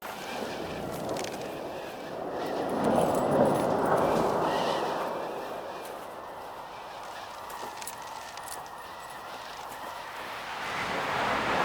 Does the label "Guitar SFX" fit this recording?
No